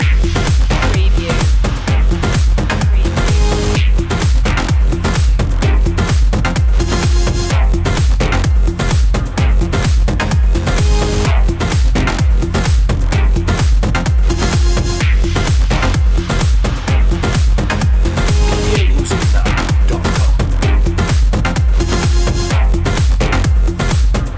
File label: tribal drops loop